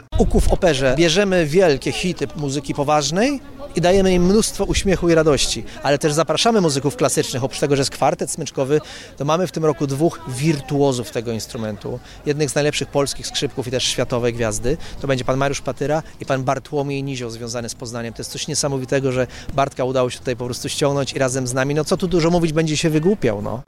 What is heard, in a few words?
ukulele; Trwa festiwal Cały Poznań Ukulele; Radio Poznań; Wielki finał w niedzielę.